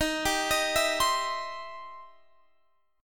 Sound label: E Minor Major 7th Sharp 5th Eb